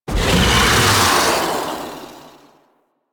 Flight Whoosh; Warframe; Teralyst; Ropalolyst

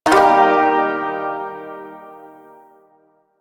Shawzin Blues Chord

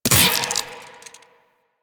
Mech, Weapons; DE; Warframe; Sentient; Shedu

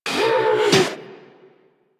Research Facil Repair Robot Rotate; Warframe; Ambience; Venus Open World; Objective Rooms; Props One Offs

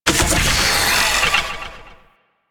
Corpus Ship Servo Mech; Warframe; Corpus Ship Remaster

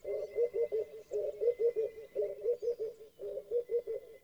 Wetland Forest Day Dove; Ambience

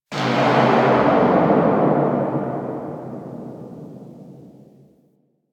Factory Thud; Warframe; Quests; TheNewWar; Ambience; Zariman

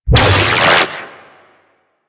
Pilot Radial Aura; Warframe; Amalgam Carrus; Abilities

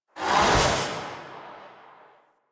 Whoosh; Warframe; Yareli Quest

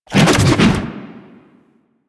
Fist Double Spin; DE; Warframe; Weapons; Archwing; Melee; Grn Arch Regor Fists

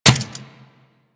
Worker Metal Leg Step Default; Solaris; SolarisWorker; Steps; Warframe

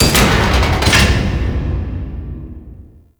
Defense Door Stop; Warframe; Corpus Infested; Infested Colony Defense Objective

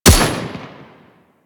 Fortress Turret Fire; Ambience; Warframe; Grineer Fortress

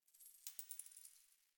Coin Drop

